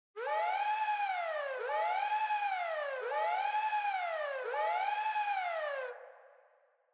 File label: Fire Truck Siren Fake Reverb Siren